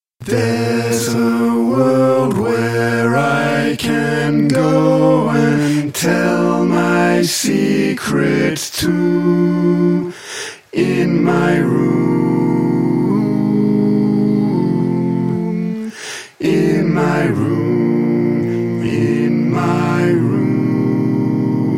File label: - SATB; pop